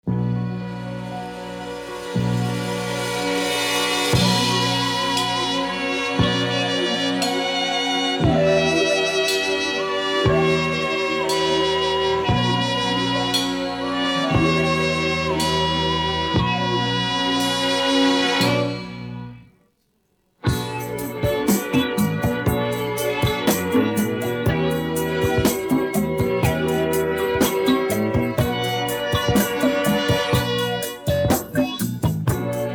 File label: instrumental; persian